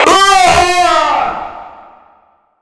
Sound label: falling